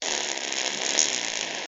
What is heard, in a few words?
Fart